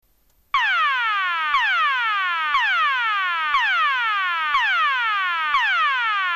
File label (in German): ton, Signalgeraete